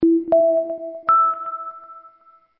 robo, ringtones

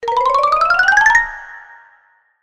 Chromatic, notifications; ZTE Axon-20-5G

